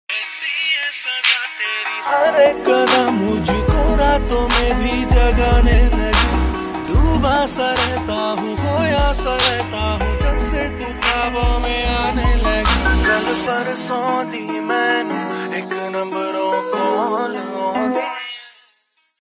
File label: slow reverb hindi ringtone